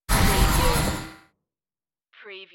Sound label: sci-fi door movement